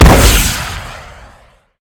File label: bazooka Shoot; Minecraft; Infamy; Last Alive Pack